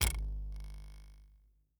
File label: Cartoon Spring Twang